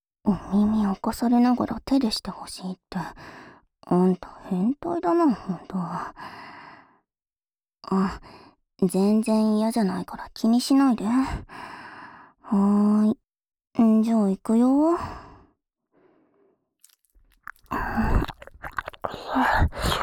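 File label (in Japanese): 『耳舐め手コキ（右）』, ASMR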